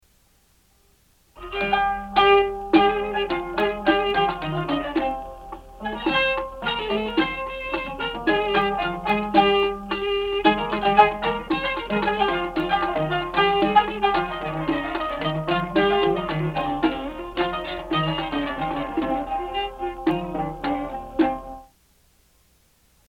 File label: Dulab 2; دولاب رقم 2; Dawaleeb